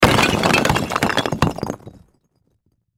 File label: Crash Rock Stone Break Debris Small Structure Wall; Blastwave